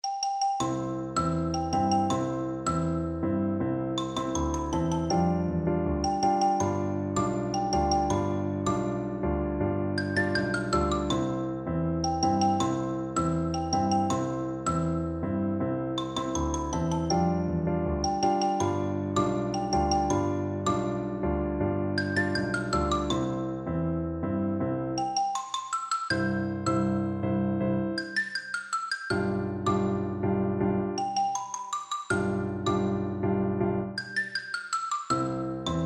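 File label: la cucaracha xylophone; percussion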